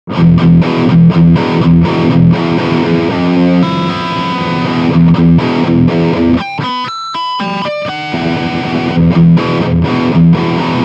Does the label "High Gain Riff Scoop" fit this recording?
Yes